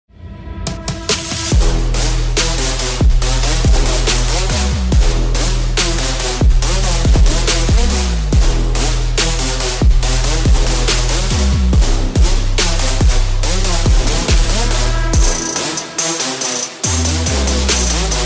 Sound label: Trap & bass mix sound